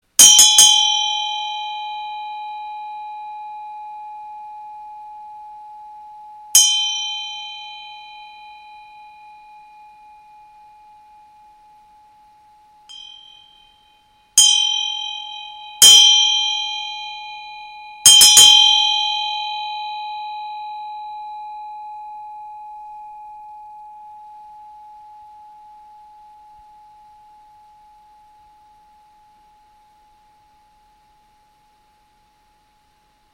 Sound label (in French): Cloche Ganesh OM; Cloche avec Ganesh et OM